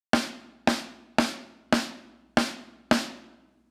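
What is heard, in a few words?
14x6.5 tama bell brass; toontrack ez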